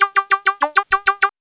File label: XPANDER; AMG - Vince Clarke Lucky Bastard